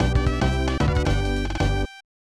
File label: Stage Clear; Diddy's Kong Quest; Haunted Chase